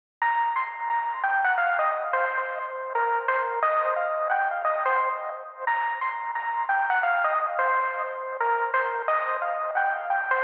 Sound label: Classic Melody Loop - 88 BPM C Min Lead; Cymatics; Oracle Sample Pack